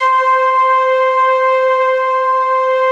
ST ORG, STRINGORGAN, Yamaha VP-1

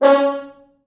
4 FrHorns; East West; Quantum Leap Horns Sax; 4 FrHorns MOD

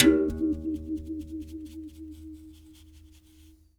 Tar, One Shots, World Percussion; Sony CS

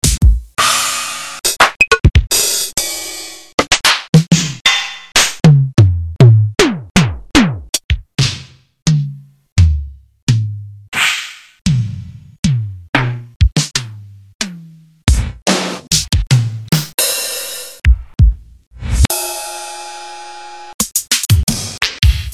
EMU Drumulator

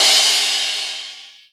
Crash, Cymbals

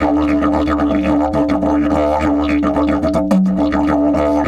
108, DIDGE 108BPM

